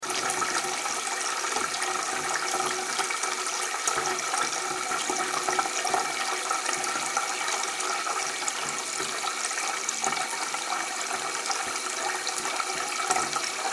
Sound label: Water Filling Shallow Bath; Bathroom Sound Effects